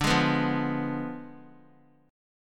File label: D Diminished 7th D down; strummed